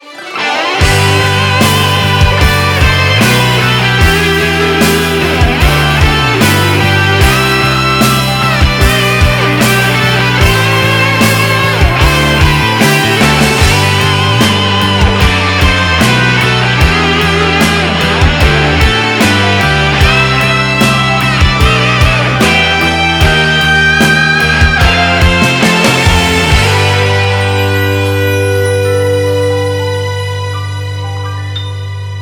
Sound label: Music Event